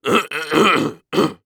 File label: Human Male Clear Throat Caveman Grunt